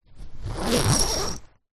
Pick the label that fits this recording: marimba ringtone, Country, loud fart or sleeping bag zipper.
sleeping bag zipper